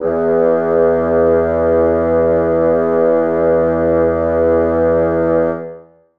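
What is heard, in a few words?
bassoons sustain e2; Sonatina Symphonic Orchestra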